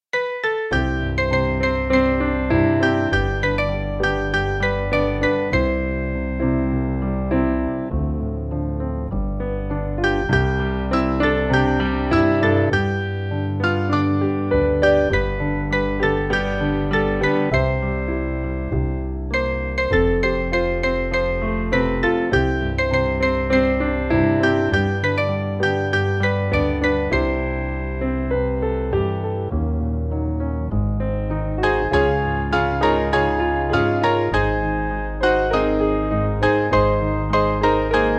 Break Forth Into Joy Piano, Free Music Backing Files for Churches; 2/C-Db